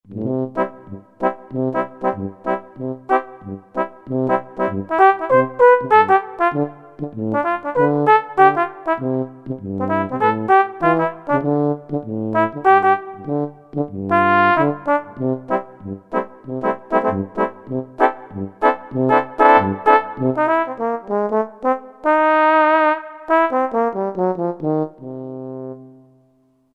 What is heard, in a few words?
Trombone; World; Latin; Roland SR-JV80-18 World Collection Latin